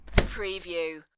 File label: Window Shut